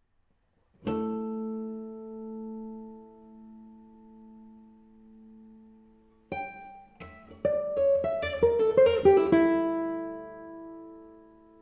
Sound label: Capricho arabe; Francisco Tarrega